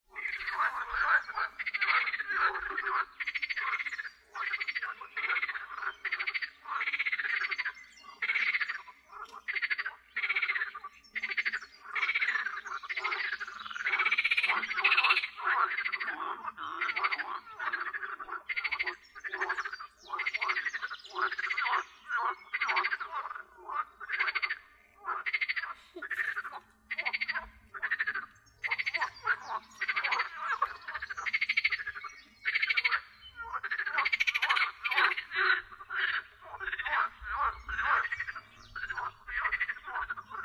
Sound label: Frog pond